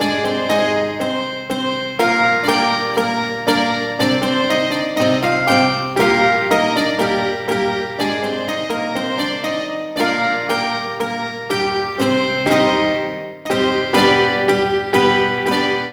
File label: Shawzin Bard Music Loop; Duviri